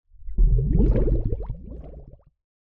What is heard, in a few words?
Bubbles Underwater Large - Submergence; Hollywood Edge; sound designer's toolkit v2